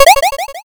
picoyes

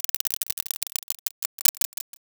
roulette

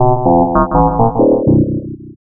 alien signal

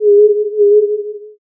owl

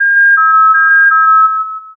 ambulance

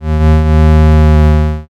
creak

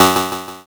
zap

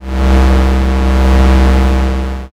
space engine

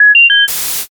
modem

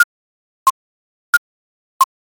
ticktock

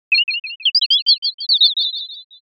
chirp mix

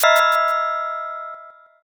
sting

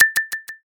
hyoshigi

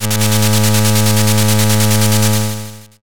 slot spin

